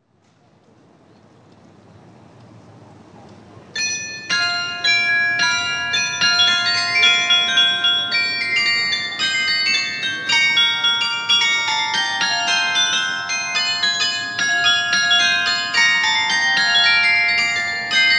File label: Spring, carillon